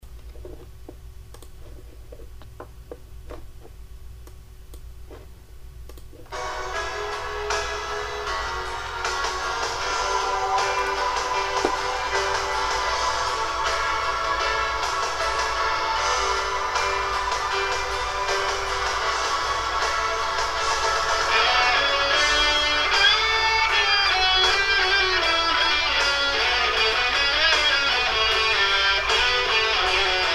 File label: solo